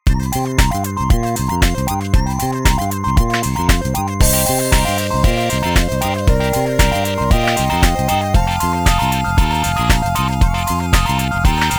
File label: bgm